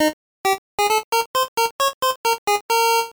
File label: Sailing Ship; Sindbad Mystery; G80 Raster; arcade